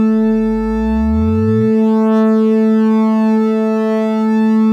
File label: hack cube tone